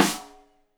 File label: Rim Shot Stereo; Deeply Sampled Drums; SMDrum